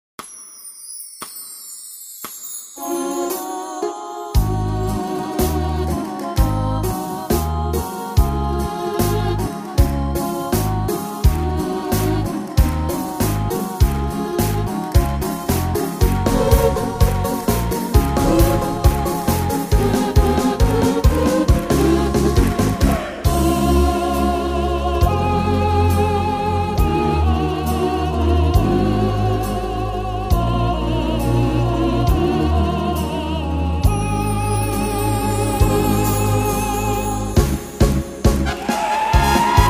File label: Tyros5